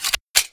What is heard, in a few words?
elite left clip in, weapons; garrysmod